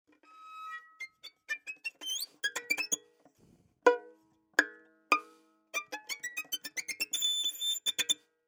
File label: spiccato, Baschet; Groupe Geste; Geste Recherche; Extrait Conférence